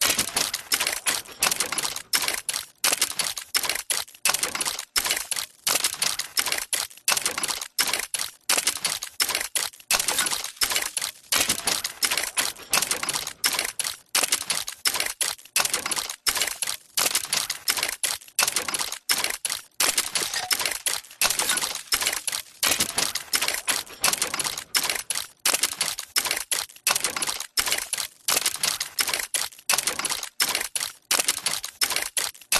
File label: Steampunk Mechanical Loop; PMSFX